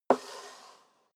step high heels wood creak slide long; schritte

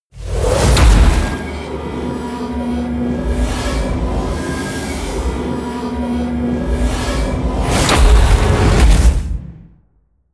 promo pad

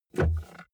car bmw e46 interior center console open soft

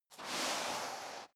step boots sand slide long

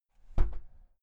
entrance door close gently outside

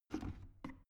plastic water boiler lift up soft; maschinen; wasserkocher

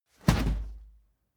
whoosh cloth fast medium; swooshes-swishes

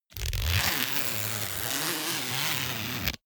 zipper pullover jacket close slow; objekte; reissverschluss

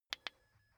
car key button click signal quick

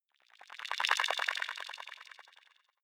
sci-fi subterranean light up; swooshes-swishes

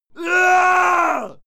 male fight scream desperate; schreie; menschen; soundpool

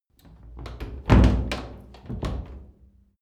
old door close hard

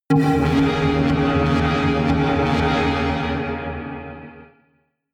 synth hit tube; whooshes rises

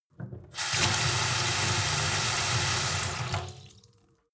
sink fill with water hard; industrie-wirtschaft; gastronomie

